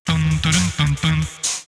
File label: Dun Da Dun Dun